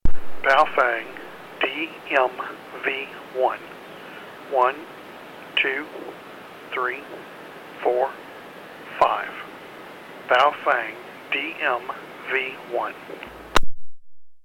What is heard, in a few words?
baofeng dmv1